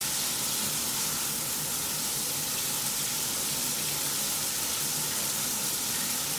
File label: shower